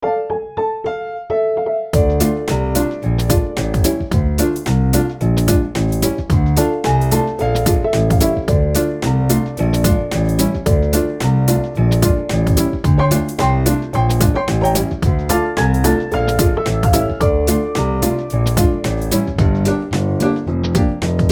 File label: Jazz Jam Ident